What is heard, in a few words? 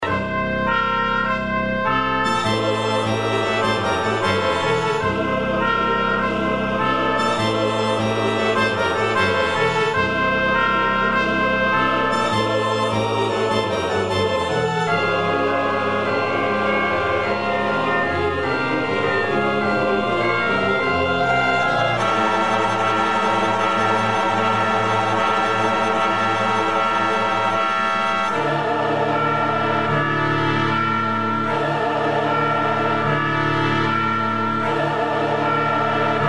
Synth